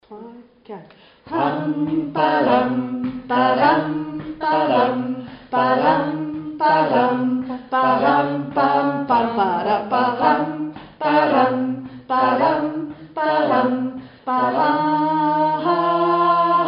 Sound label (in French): basse; Paroles : Bande à Rosa (Chorale d'Amiens), sur l'air des Archers du Roi